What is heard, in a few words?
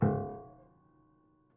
Prepared Piano